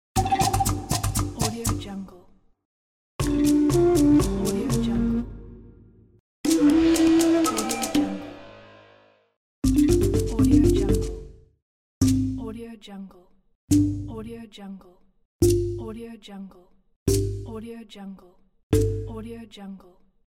aztec mayan music sound pack

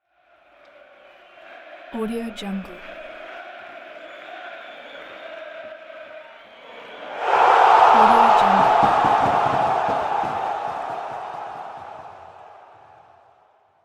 singing fans goal ovations

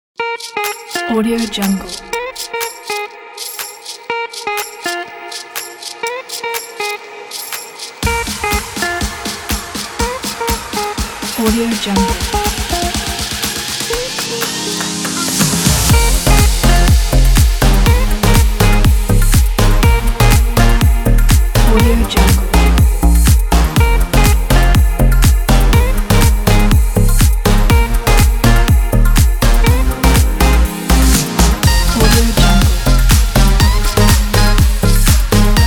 edm summer full moon party; magicsample

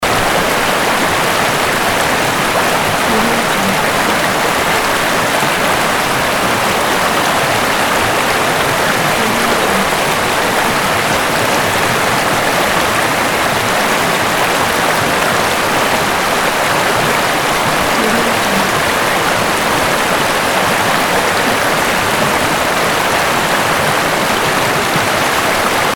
river

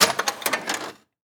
tool grab; prosoundfx

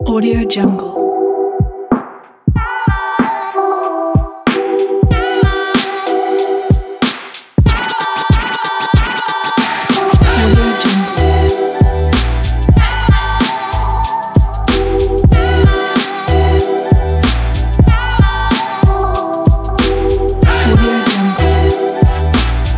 smooth vlog hiphop

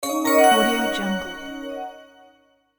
magic spell

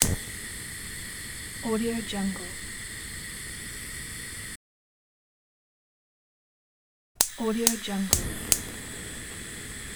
gas

